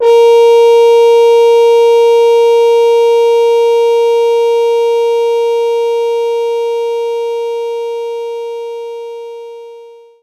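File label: French Horn A#3; knights try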